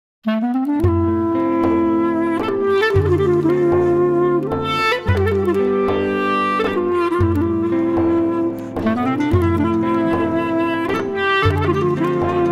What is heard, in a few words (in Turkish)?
duygusal muzik klarnet; arka sokaklar dizisi muzikleri